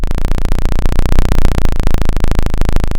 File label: pop, chainsaw